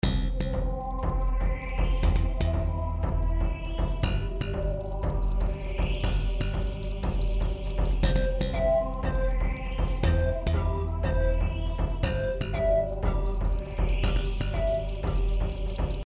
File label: Whack